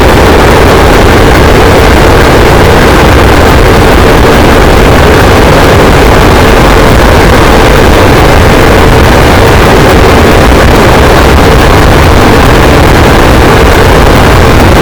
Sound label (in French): cachalot negatif, boat, Physeter Macrocephalus